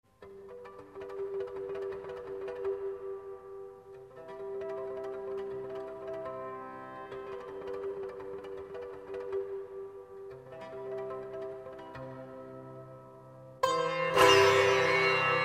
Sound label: Sitar